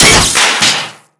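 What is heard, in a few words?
metal panel; Explosions; modern warfare 2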